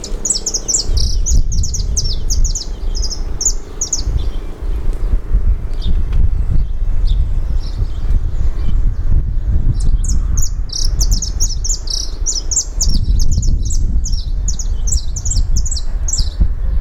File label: Sicalis flaveola song